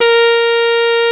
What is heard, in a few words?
Mute Pet, as4; BGM; Nintendo; July 2020 Gigaleak